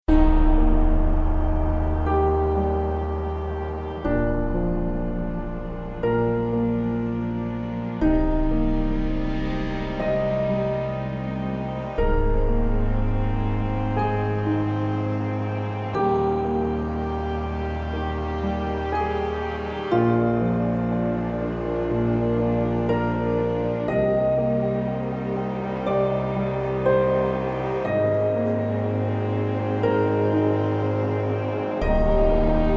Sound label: UI Front End Loop